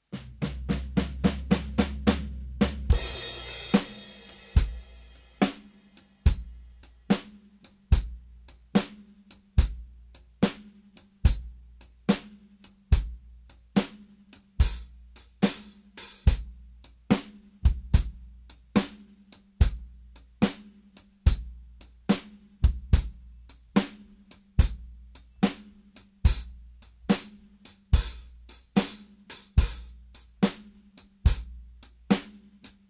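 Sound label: 72 70s blues full mix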